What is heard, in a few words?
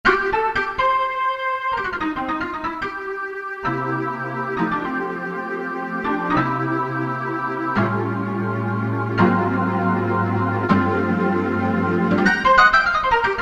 Organ - Syn Lead, Roland EM-10